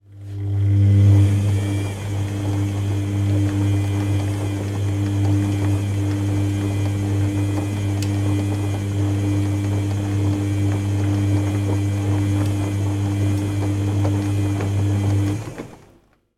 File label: household washing machine short single washing cycle